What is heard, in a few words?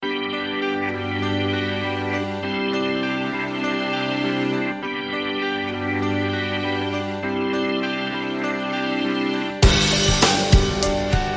Rock Stadium Hymn